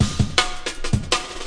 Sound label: 163 verby rolloop, drum loop; 161 to 180 bpm